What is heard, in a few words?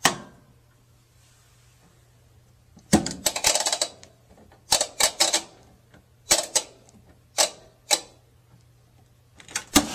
washing; CosmicRage